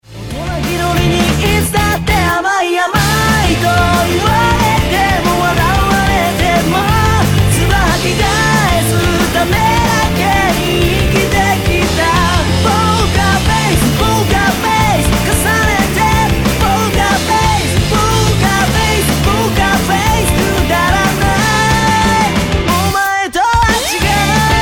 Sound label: music